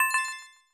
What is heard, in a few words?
sparkle flat